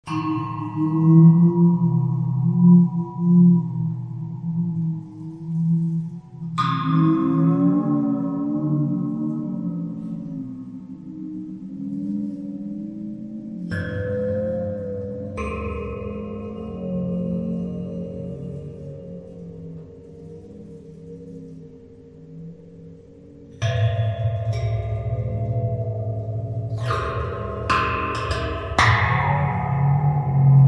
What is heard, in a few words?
waterphone finger